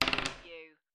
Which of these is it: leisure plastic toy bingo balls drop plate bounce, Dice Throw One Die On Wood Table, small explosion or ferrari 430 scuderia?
Dice Throw One Die On Wood Table